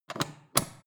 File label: foot switch turn on off depress full